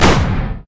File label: auto hit